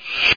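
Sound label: short sound of arrow flying past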